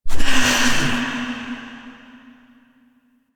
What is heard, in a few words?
Hand Compass Cast; Warframe; Duviri